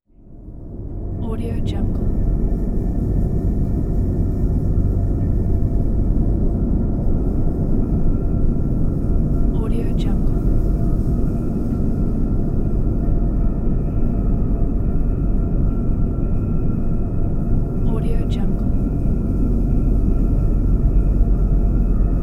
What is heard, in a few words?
space ambience